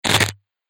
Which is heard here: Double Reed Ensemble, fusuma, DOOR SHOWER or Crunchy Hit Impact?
Crunchy Hit Impact